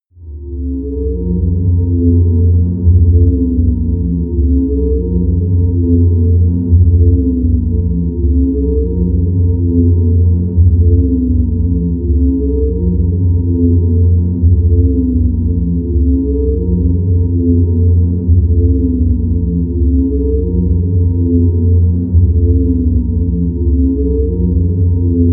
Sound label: drone swirling